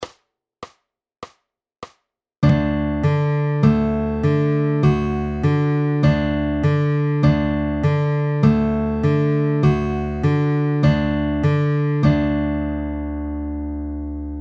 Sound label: Drop D, fingerpicking